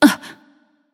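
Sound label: nonverbal surprised; smite; voicepack; izanami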